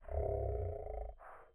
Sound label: dino low; angband